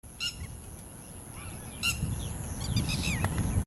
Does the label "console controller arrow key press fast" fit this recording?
No